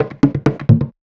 perc bongo man; Drum Sample Pack; One Shots; Slate; SEISMIC

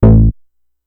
Synth oneshot A, Synth Bass One Shots; Slate Digital BASSHOLE Sample Pack

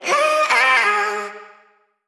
Vocal Sample Pack Phrases Ab

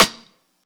clap one shot, Pop drums

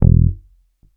Bass one shot Short Low Dark E, Electric Bass; Slate Digital BASSHOLE Sample Pack

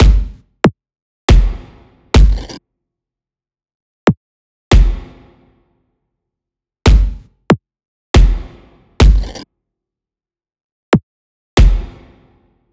kick loop 70; Pop; Drums; Kick Loops; Slate; SD PASADENA Pop Sample Pack

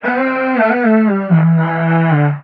vocal C; ease; SD AURORA Sample Pack; Slate

